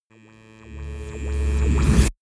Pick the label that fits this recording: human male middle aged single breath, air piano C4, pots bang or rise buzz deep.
rise buzz deep